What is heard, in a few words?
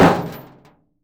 metal plate hit